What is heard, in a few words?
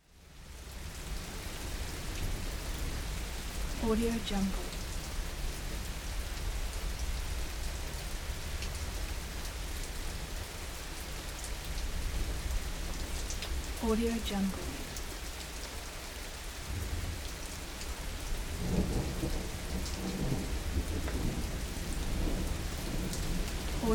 rain and thunder in the garden; mountainaudio